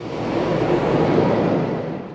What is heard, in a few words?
A DRIVE; RSIXDEMO